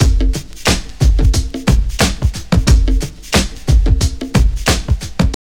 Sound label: TRIP 90, trip loop